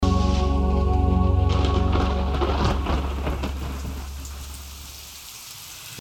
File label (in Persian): صدای بارون و رعد و برق